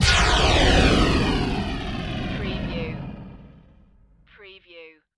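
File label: Production Element Hit Whoosh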